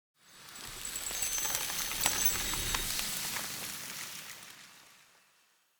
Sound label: Eternium Garden Courage Seed Grow